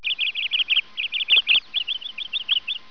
OISEAU; MOBICLIC 95; CPLUSCLAIR

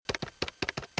tape avion

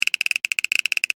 CLIC; MOBICLIC174

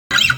couin; MOBICLIC 14; europe

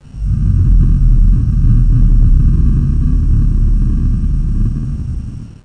fond; Tron 95

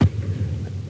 crabe; Emme; Arc En Ciel Et Le Lagon Merveilleux; French Version

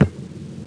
crabe; Emme; Arc En Ciel Et Le Lagon Merveilleux; French Version

